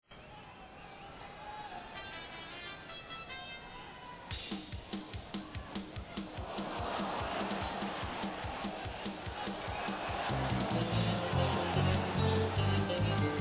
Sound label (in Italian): ORCHESTRA SICILIANA; palermocalcio